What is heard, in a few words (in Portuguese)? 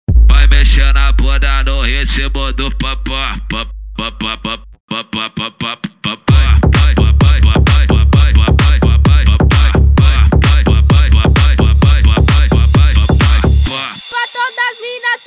eletrofunk